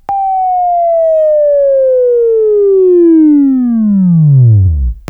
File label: Buzz; Labview-Program; Sweeping Training program